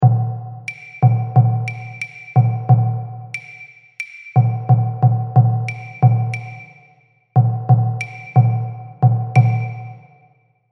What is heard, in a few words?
Tom Clave